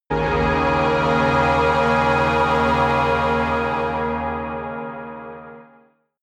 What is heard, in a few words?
synth hover